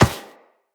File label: Walk Metal, Footsteps; Operator